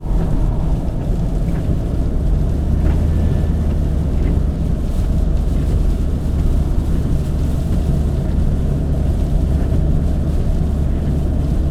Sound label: car suv drive rain drizzle wipers 100kmh; transport